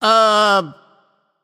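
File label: seriously, nonverbal; smite; voicepack; khepri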